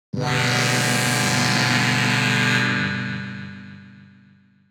Dark Fantasy; jup8; Dream

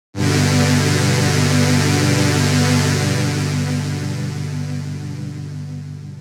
Synthwave PAD FAT, jup8

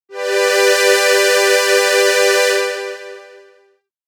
Hi Strings, jup8

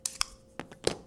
glock removing magazine

